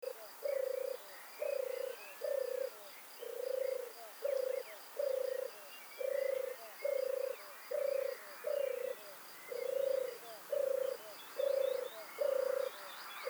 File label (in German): Turteltaube Streptopelia turtur